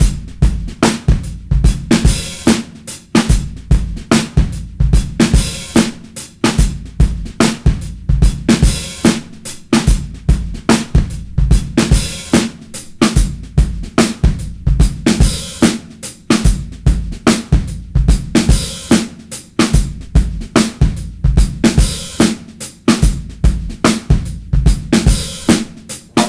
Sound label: 73 bpm, Breaks; POTBreaks